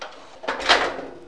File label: gate close; Hellboy